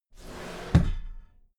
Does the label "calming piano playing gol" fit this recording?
No